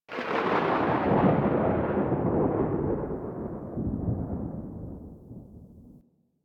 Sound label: Landscape Thunder Crack, Ambience; Warframe; Venus Open World; One Offs